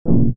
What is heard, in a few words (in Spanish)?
acusticos